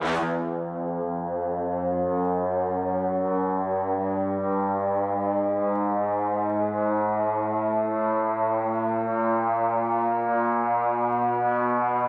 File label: near Win; piggy riches